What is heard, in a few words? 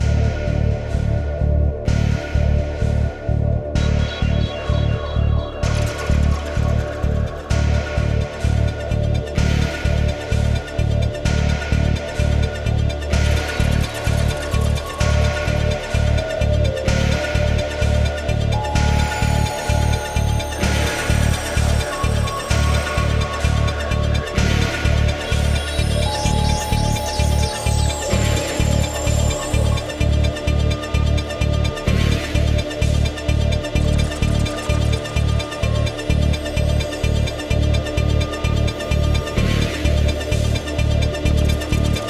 Escape Ambience; assassins creed ii